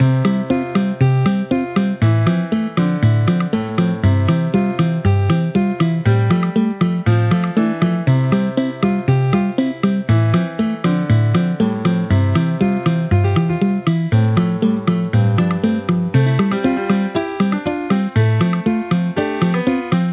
menu music; leapster2

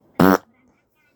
fart

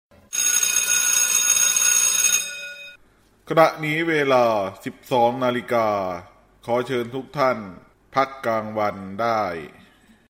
12 00 n classic ring

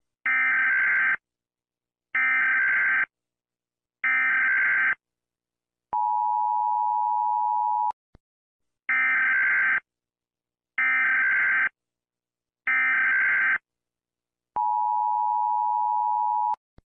usa eas alarm

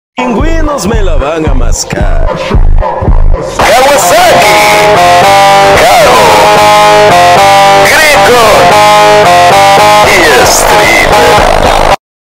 kawasaki bass ultra

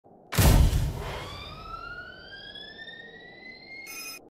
beacon; flash

